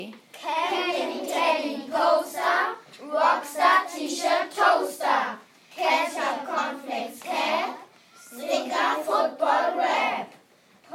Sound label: The English Rap class 3b